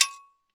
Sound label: sword hit